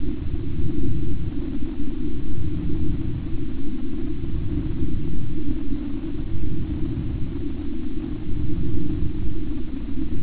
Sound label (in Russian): vozduh; de pripyat; spawn